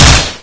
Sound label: fire both, weapons